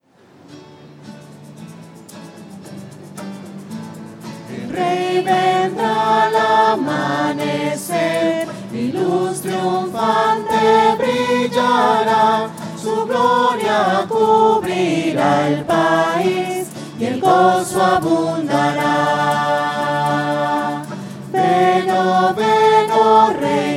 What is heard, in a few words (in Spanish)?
voces; misa